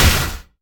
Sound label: Impact Arcane; smite; god merlin